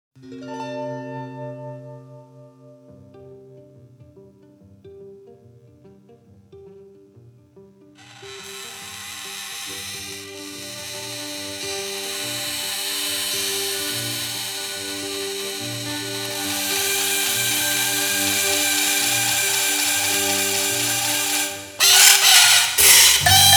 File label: savart